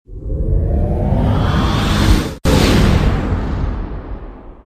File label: Warp; Star Trek